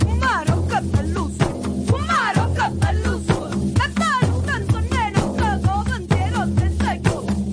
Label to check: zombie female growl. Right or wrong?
Wrong